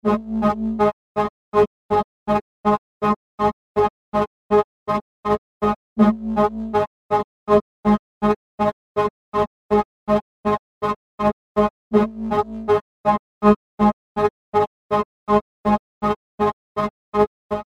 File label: pulse